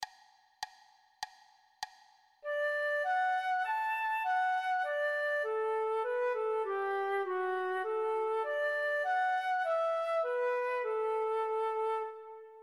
Fa diese, flute a bec

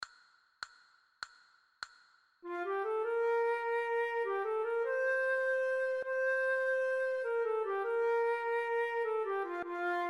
Si b, flute a bec

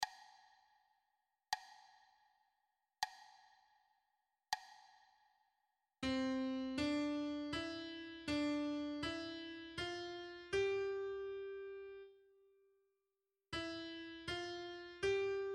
tricordes 40 50 60, flute traversiere

